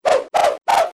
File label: Semitones 0 2 4